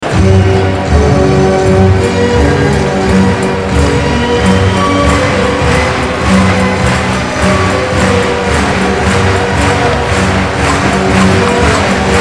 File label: ORGAN HAVA NAGILA; OLYMPIC